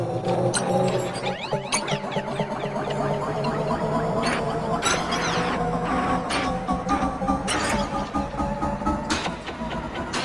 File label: drone rhythmic tweak glitch shepard tone pitch rising; fvtt sonniss gdc audio